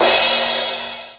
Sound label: CRASH, Big and Heavy Real Drum Kit; REAL LIVE KITS